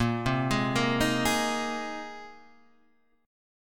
A sharp 7th Suspended 2nd Suspended 4th, guitar chord; arpeggiated; chordshape; 6,3,6,3,4,4